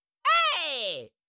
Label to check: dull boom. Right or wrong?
Wrong